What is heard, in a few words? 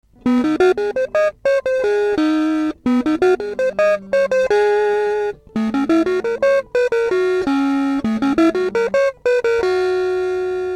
Pitch Shifter